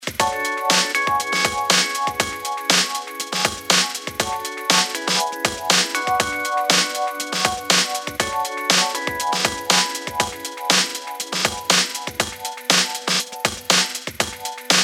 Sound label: RHODES, ring tone; HTC; E-Club Ring Tone Pack 2